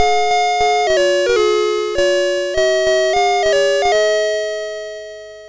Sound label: sid MOS6581R3, GAMES; Alice In Wonderland